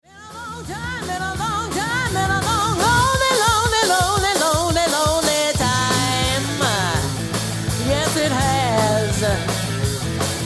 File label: Drd Rock and Roll